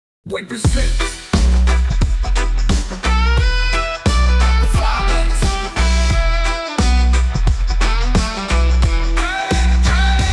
Violent Rain Drain Reggae; A Negril Chill

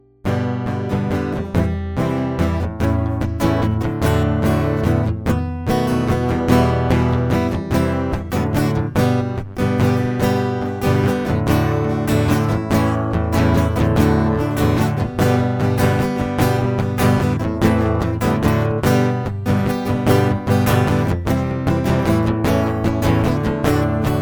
We Wish You a Merry Christmas acoustic; Holiday Cheer Hear

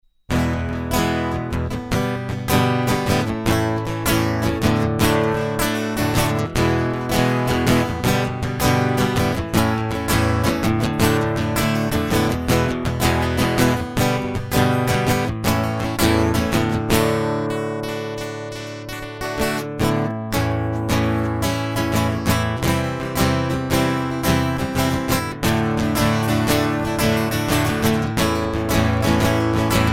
Guitar Prelude; Love Alive

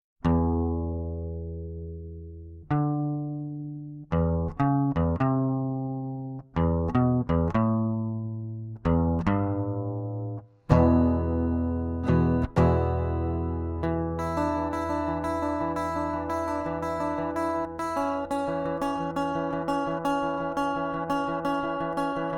Instrumental

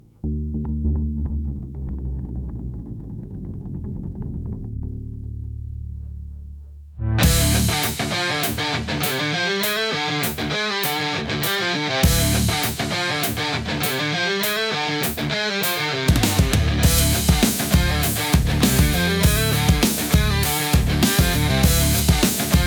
Instrumental Reprise; Pyyg Pot

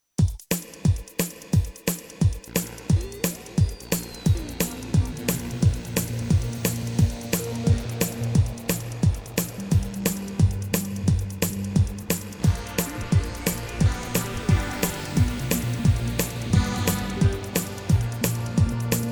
Instrumental; For the Birds

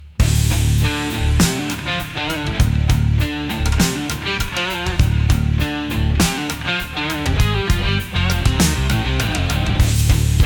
Pyyg Pot Instrumental

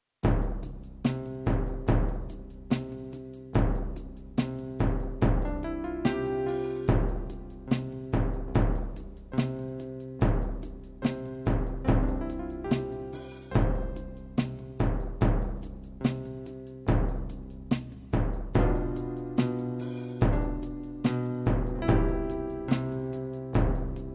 Piano Sorrow Instrumental

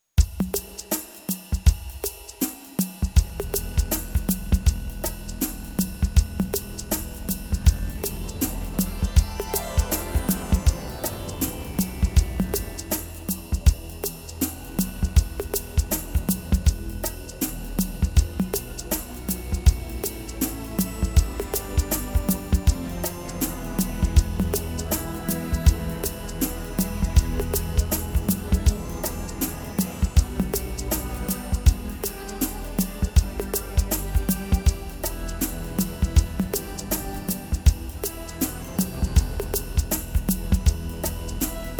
At It Again Instrumental

